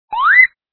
slide up

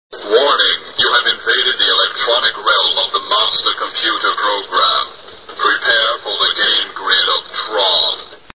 people

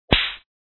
slap

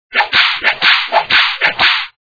whip

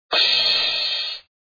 crash

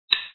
sticks

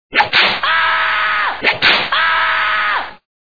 beating

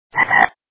frog

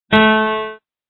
piano

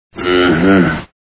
uhhhuh

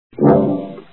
music

